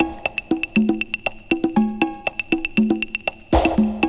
BREAK

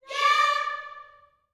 "yeah" D 5, Gospel; Spectrasonics; Vocal Planet Vol.1 Gospel; akai

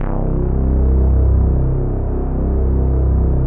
pad, atmo pads; Best Service; XX Large

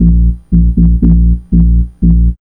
B-LOOP

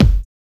BD; E-Lab; X Static Goldmine 1

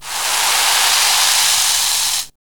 FIZZ FX

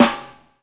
SD FUNK, SNARES; E-Lab; X Static Goldmine 2

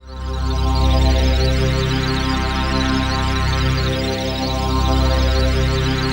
PHASE PAD, ATMO PADS; Best Service; XX Large

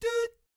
DOOT A#4, Vocal Jazz Fx; Spectrasonics; Vocal Planet Vol.3 Jazz Fx

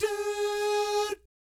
DOO SFZ, Vocal Jazz Fx

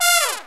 stab; ZERO-G; Datafile

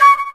D.FLUTE; E-Lab; X Static Goldmine 2; akai

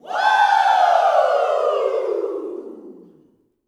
"WOO", Gospel, YELL SHOUT; Spectrasonics; Vocal Planet Vol.1 Gospel; akai

